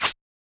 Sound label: snake attack